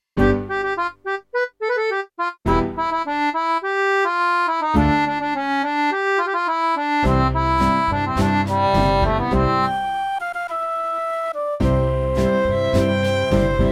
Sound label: C major flute music